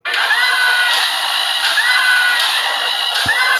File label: sock screaming